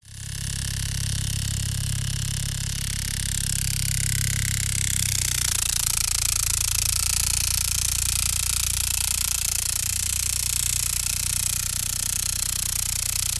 science fiction micro flying drone helicopter propellers flying; zapsplat